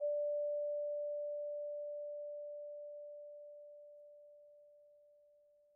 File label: Vibes soft D4, Vibraphone, Soft Mallets; Struck Idiophones